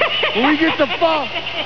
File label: laugh; chucky